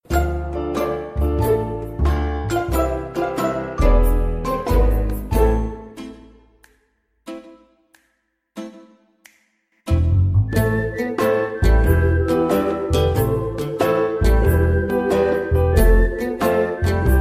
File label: Jingle; UPDC; radiotintouin